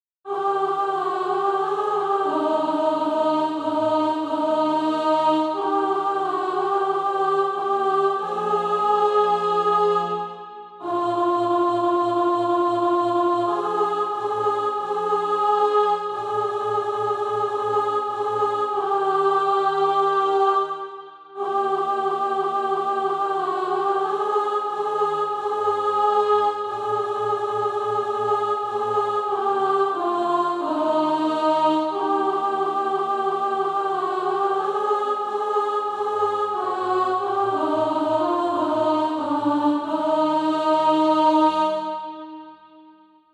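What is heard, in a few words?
alto, SATB Practise Music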